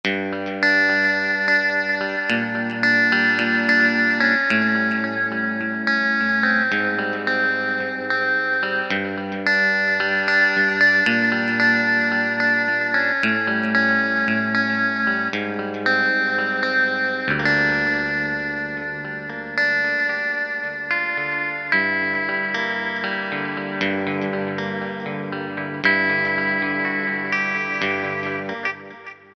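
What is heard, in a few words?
Guitar, Yamaha TG500; Preset Voice; GT Strt1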